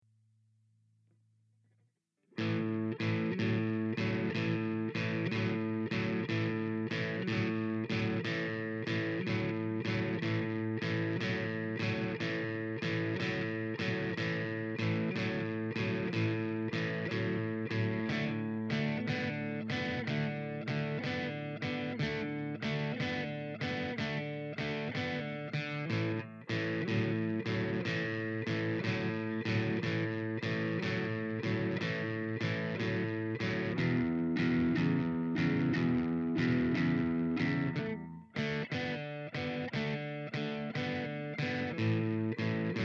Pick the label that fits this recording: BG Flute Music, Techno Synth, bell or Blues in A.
Blues in A